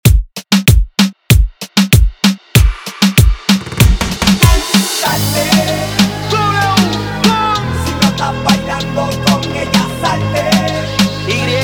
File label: outro 96 bpm